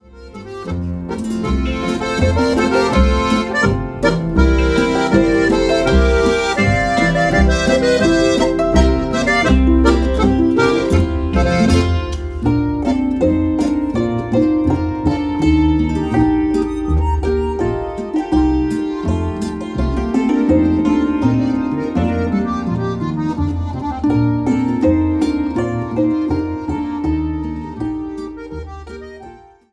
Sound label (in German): boarischer, familienmusi